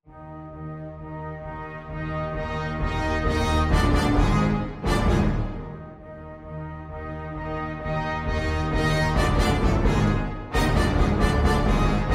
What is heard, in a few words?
Ode orch accomp; wordsworth